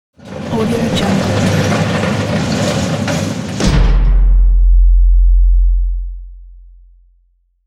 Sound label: jail door close